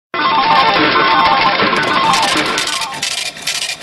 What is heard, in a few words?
Tones Rain Song